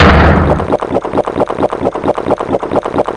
chug super

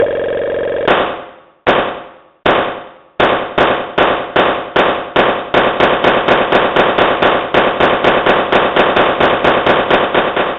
breakdown; cammy

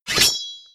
knife; Datz

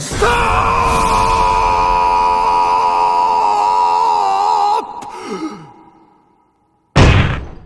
breaks; redd

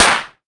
pop; dgs2-rumba

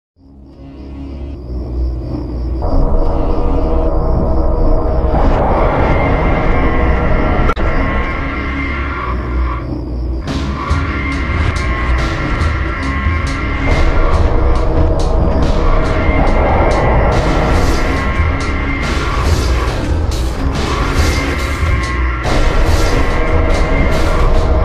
Tension

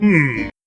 hmm; loz; king

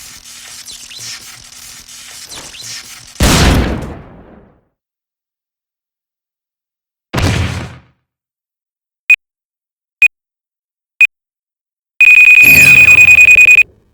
breakdown

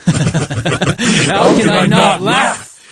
Laugh; Yu; p4u-ShadowYu

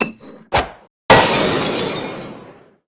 wine throw; barok

